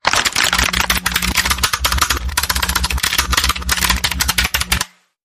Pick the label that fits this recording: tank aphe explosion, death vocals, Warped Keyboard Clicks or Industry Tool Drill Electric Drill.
Warped Keyboard Clicks